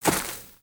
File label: Footstep; Lego; Strombie; creatures organic